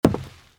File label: step; sahur